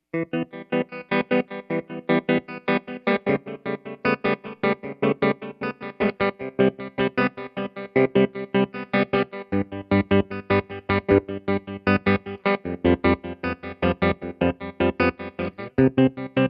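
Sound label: rhythm in 5, Rhythm in 5 - One of the 8 rhythms on the Empress is in 5. Things get interesting when you use it on a part that's in 4.; empress tremolo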